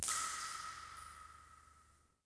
Cave Drip; water splashes

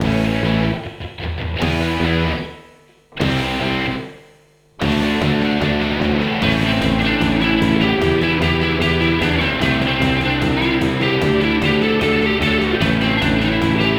music race; TyTheTasmanianTiger3; XBOX

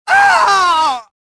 death, prisoner

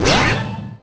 perfect landing; Tony Hawk's Project 8; XBOX

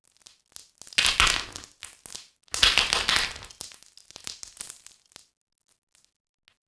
small debris falling; Max Payne; subway

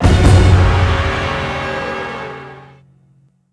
Sting

